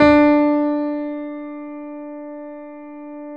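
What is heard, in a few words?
grand piano D 3; USB Soundscan; AKAI; Keyboards Acoustic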